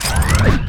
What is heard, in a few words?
Scifi Forcefield Activate; Energy & Forcefields